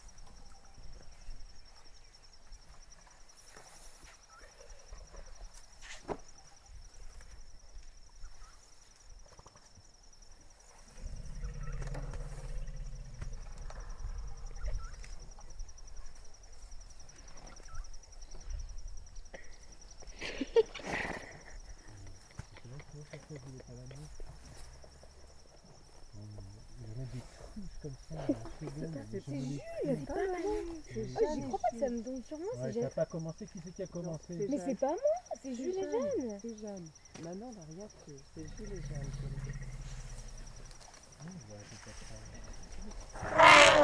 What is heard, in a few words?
Elephant